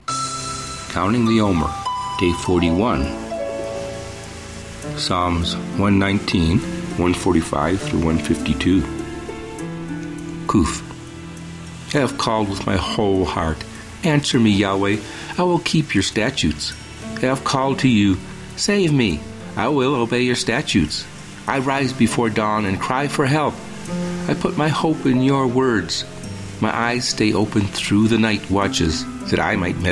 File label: Meditative w Waves Ocean; Omer; Psa119